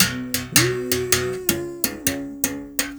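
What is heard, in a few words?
BS BERIM GRV, BERIMBAU; Spectrasonics; Heart of Africa 2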